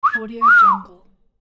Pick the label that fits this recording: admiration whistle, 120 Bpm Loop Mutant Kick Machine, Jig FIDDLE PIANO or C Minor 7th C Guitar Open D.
admiration whistle